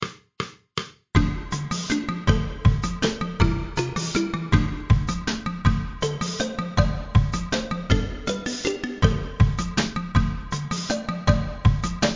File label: Boomwhacker